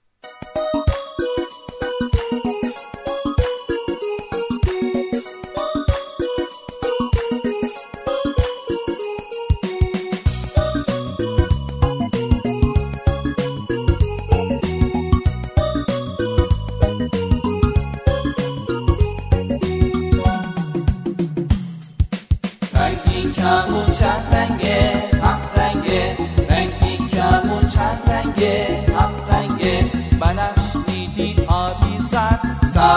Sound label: Happy children's song